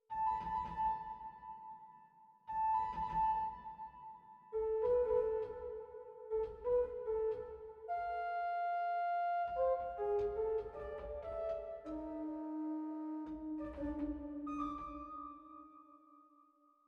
Pedaal Vlakfluit 2; zwolle

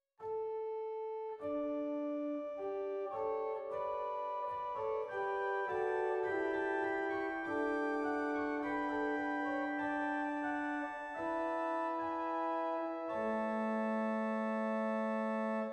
Borstwerk Praestant 4; zwolle

